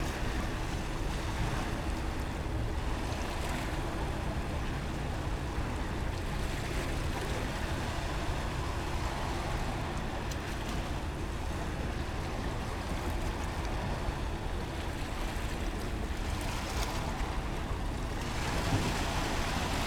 Ocean Sounds Ocean Beach Waves Water Sounds Lasting Waves